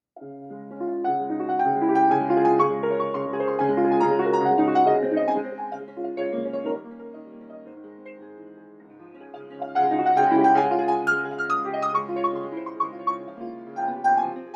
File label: improv Db maj, piano improvs; D♭ major; Anticipation (à la Schumann; Noam D. Elkies piano improvisations